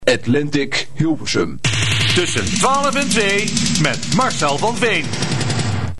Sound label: drums; Dit is 98.3 Atlantic